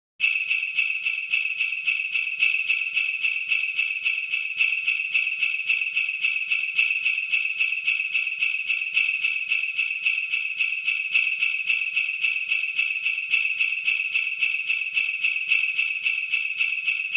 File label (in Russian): bubency ritm